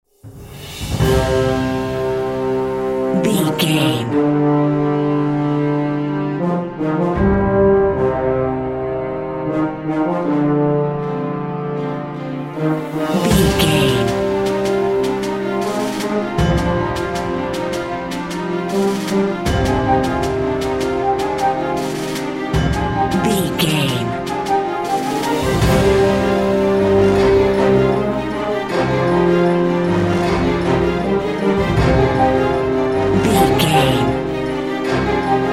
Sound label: music journey to the battlefield